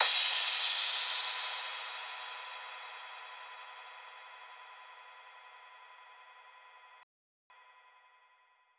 Drum Loop 128BPM Crash; VEC5; Drumloops; Multi Loops

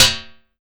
XTRA PERC

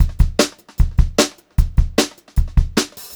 BEAT, Brushed Drum Loops

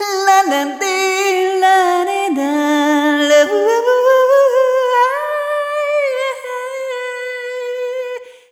Arrhythmic G; Sonic Foundry (Sony Creative Software); ILONA

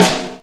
SD, Dark Side Of TripHop

